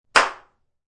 A single clap.; Applause; Human

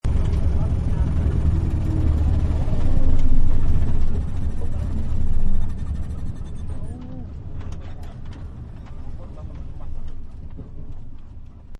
Tuk Tuk Stop, Carriages; Vehicles; Partners In Rhyme

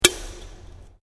Can Aluminium Inside Crack; various metal cans; Cracking; Objects; SoundEffects-Foundation1

